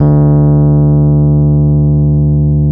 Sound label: D 1, Electric Pianos; Wizoo